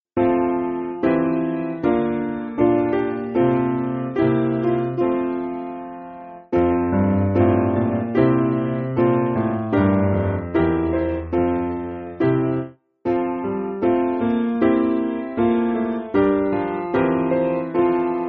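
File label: Piano, Backing Files for Churches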